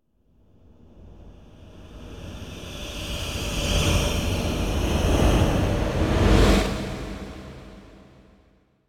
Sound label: Whoosh Hover Air; Hollywood Edge; sound designer's toolkit v2